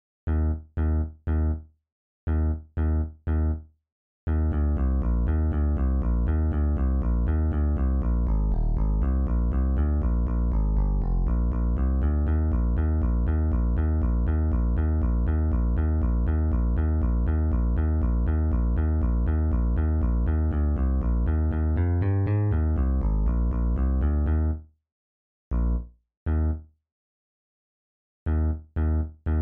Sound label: bass